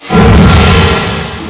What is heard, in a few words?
fail, airfist, weapons; Quake; PainKeep